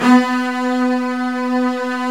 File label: MIDI Section; Roland L-CD702; Combos 2